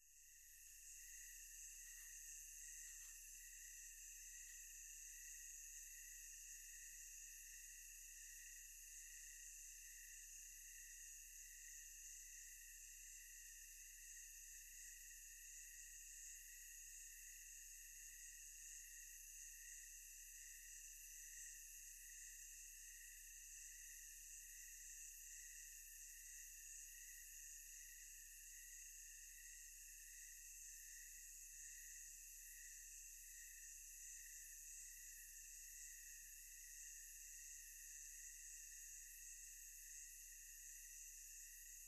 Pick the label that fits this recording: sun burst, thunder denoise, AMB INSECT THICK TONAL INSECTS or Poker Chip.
AMB INSECT THICK TONAL INSECTS